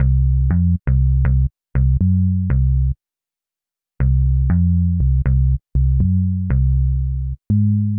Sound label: Comp Bass